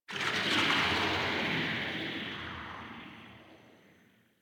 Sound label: Interior Cave Texture Rock Movement; Warframe; Infested Eris; Misc Indoor